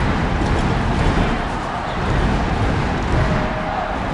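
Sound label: Boxing Crowd Large Stomp Loop; Crowds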